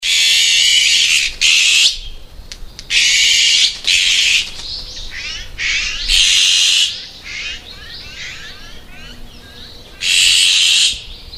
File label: onaga, bird; sayama